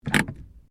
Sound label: CAR TOYOTA COROLLA HANDBREAK ON, hatchback; 2009 Toyota Corolla hatchback; Cars (Sound Effects:Vehicles)